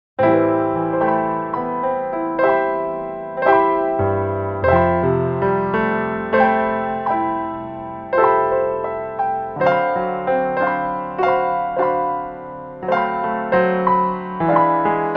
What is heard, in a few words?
solo piano